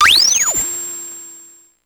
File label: Drum, Electro-Harmonix Spacedrum